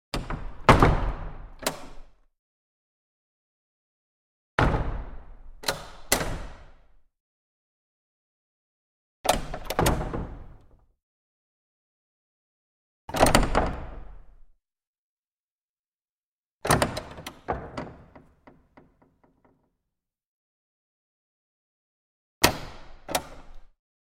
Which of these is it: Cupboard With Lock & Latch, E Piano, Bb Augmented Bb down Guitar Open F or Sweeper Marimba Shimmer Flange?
Cupboard With Lock & Latch